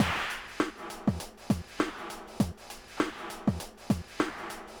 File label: drums